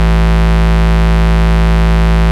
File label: Bass Root A